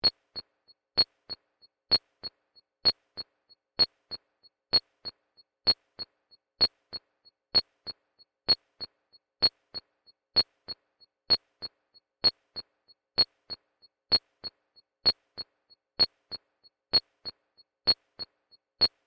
Telemetry Blips, Scifi, Telemetry, Blips, Science, Fiction; Communications; BlastWave